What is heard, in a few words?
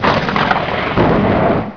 thunder